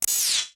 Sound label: World Map Zoom Out